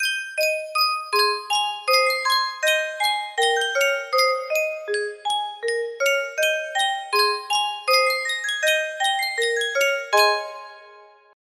music box, music box melody

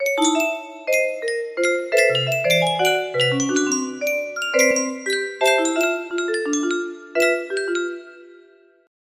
water music box

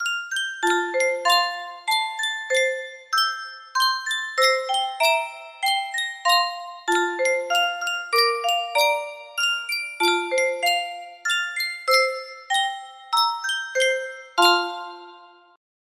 Yunsheng custom tune music box, music box melody

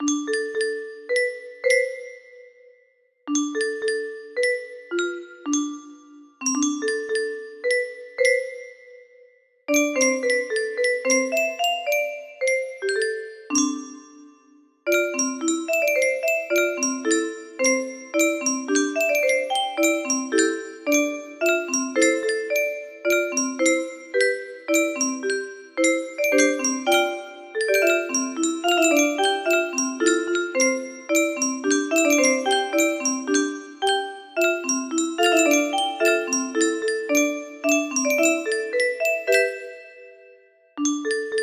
melody, music box

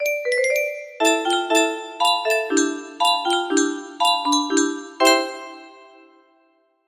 music box melody

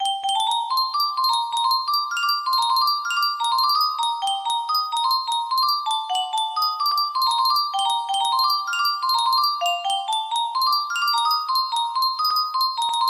yunsheng custom tune music box

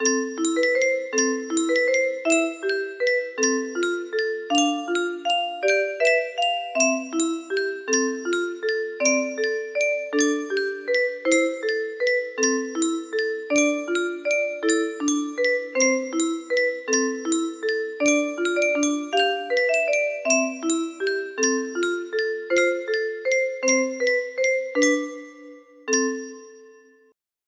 melody

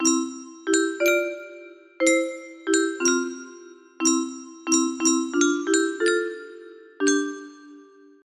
melody

